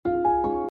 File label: Message Ringtone; Race 3